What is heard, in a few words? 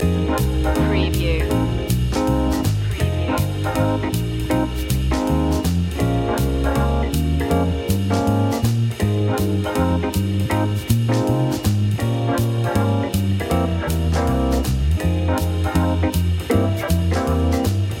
jazzy mood loop; jazz-blues